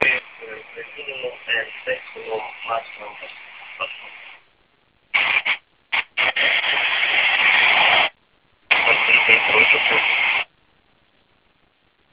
a380; over kurgan

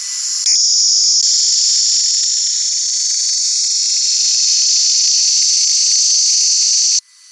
4504.00 ofdm-122

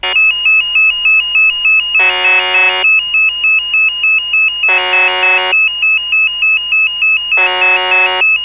4625khz am qrm unid

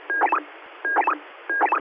FM signaling

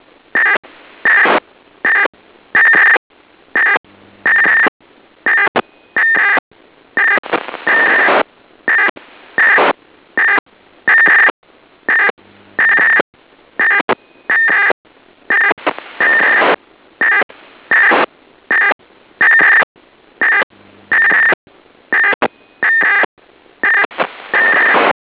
signal

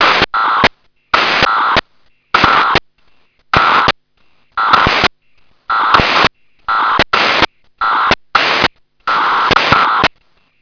signal

